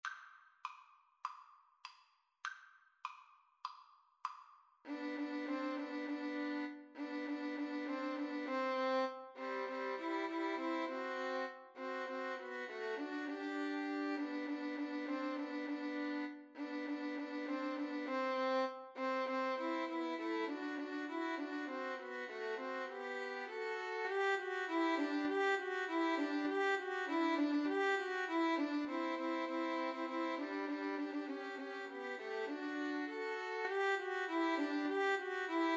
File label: jingle bells 3 VLN